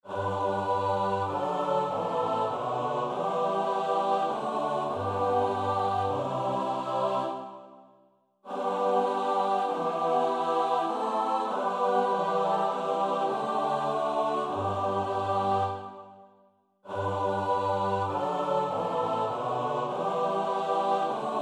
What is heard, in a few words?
Psalm 25 (S-A-T-B), Vesperkoor; Nieuw Liedboek; NLB 025; heer, ik wil mijn hart verheffen; Oefenbestanden